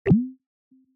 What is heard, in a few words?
diff line deleted